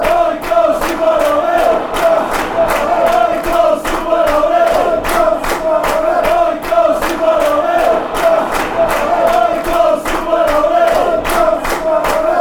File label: owen coyles super white army, fan chants